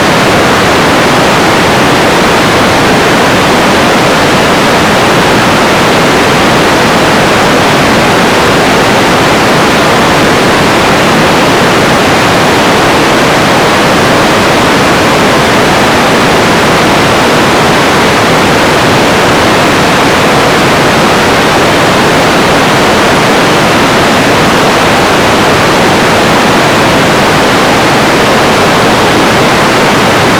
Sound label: Coloured Noise +12dB (L=R-6dB Stereo); radio test radio experiment